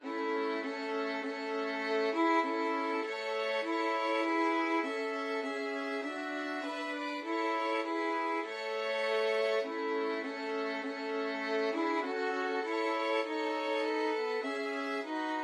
violin trio

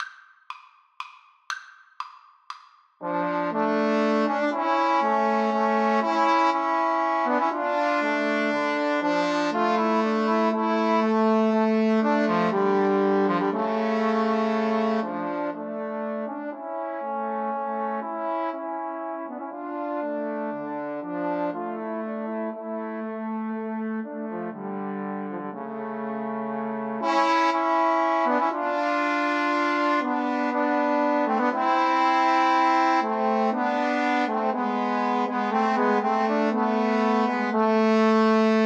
Trombone trio

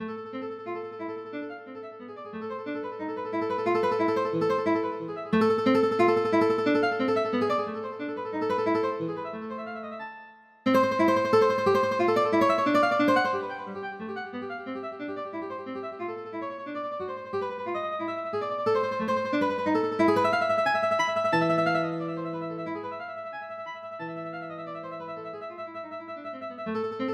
study, guitar; carcasse; study 9

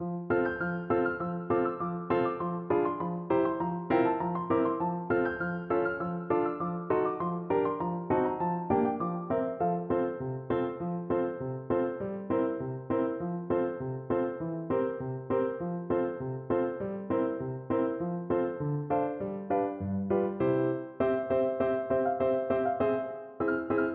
three little maids, Flute

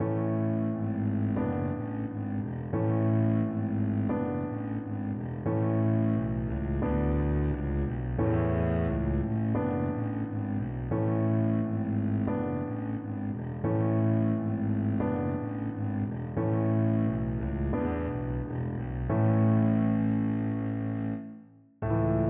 double bass

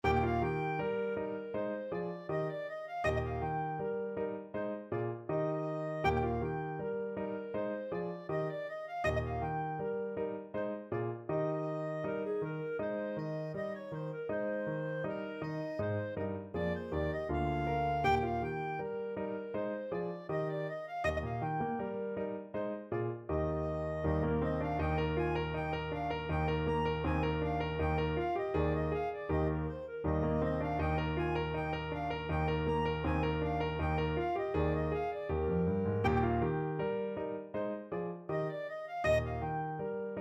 gavotte clarinet; bach gavotte 2 bwv1012

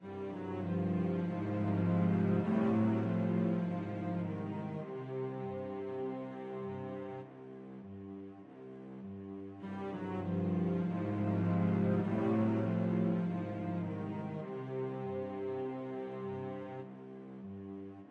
VLC, cello duet

